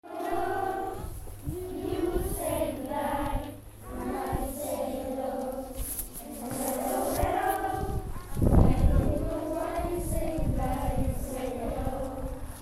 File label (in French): son chant lundi matin